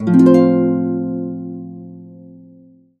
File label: harp chords, F maj A; Complete Harps; USB Soundscan vol.62; AKAI